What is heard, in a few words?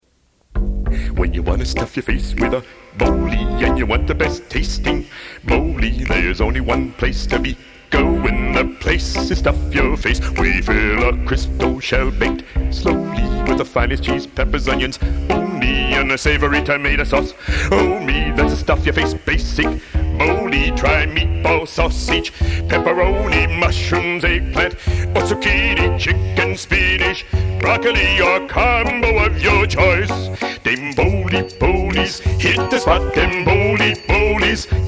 jingle